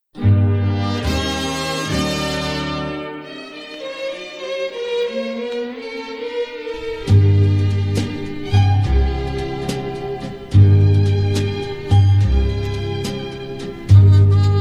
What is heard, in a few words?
strings